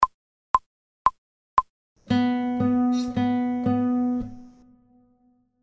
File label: fast, guitar, without metronome